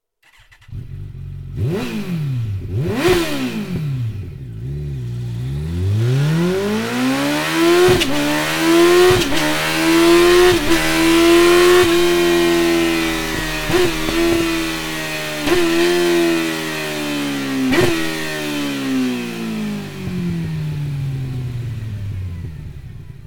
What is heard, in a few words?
akrapovic slip on exhaust kawasaki zx14